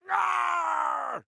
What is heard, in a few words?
argh; lechuck